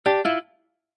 Casino Electronic Sound Two Tone, Slot Machine: Electronic melodic sound; Casino, gambling.; Gambling Sound Effects; Lynne Music